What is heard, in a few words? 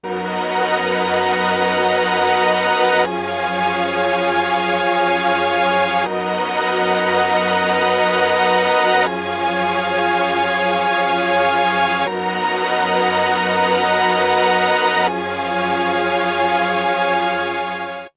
stringtron stereo clone theory